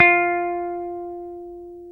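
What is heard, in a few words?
guitar, Dan Electro, 6 string; Dan-O 6 Str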